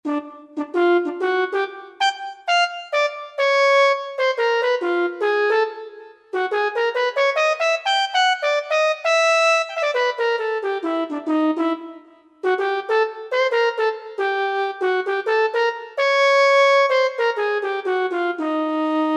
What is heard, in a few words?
Horn, Roland JD-990; Factory Patches; Bad Wolf Horn